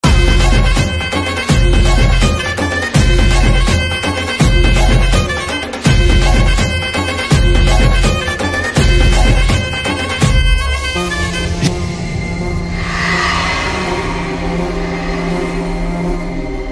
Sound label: fire song bgm; kanguva